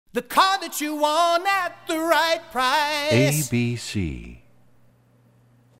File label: Car, ad jingles